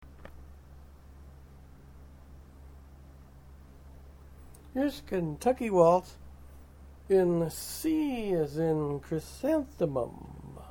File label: Waltz C, Fiddle; Fiddle chords